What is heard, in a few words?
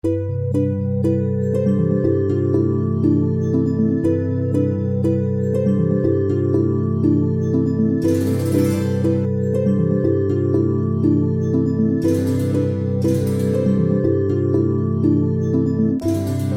drone